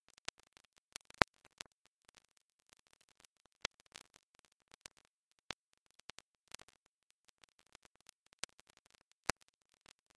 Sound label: noise only